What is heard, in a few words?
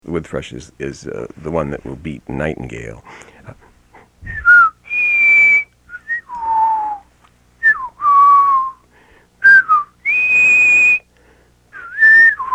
woodthrush